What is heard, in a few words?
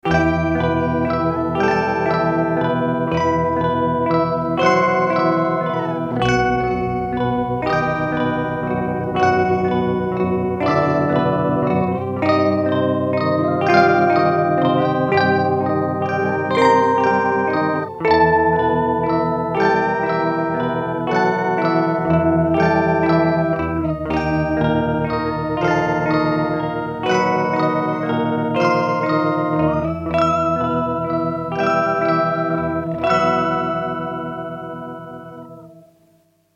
Octaver; Matthews Effect Chemist